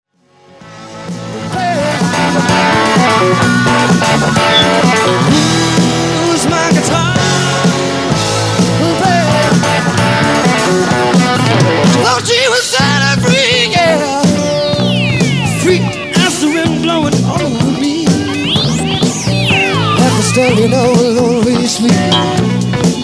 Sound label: blues my guitar